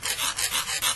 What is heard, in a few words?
craft sand; ambient